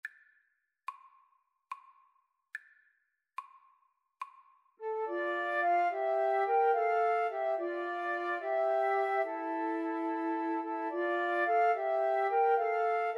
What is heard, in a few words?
gabriels message, Flute Trio